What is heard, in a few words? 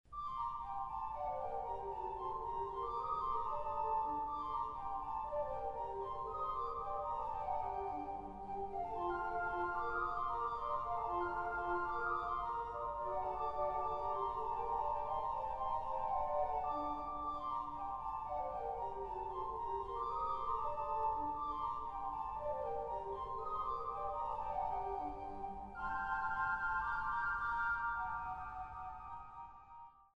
Flute Piece